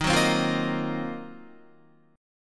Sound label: Eb Minor 6th Add 9th Eb down Guitar 4E,4A,5D,5G,5C,6F; strummed